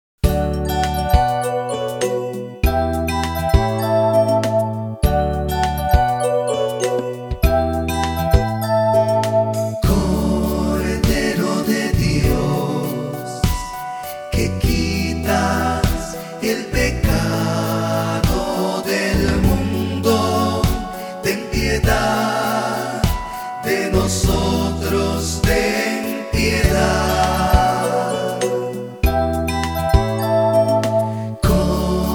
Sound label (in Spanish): Cordero cantado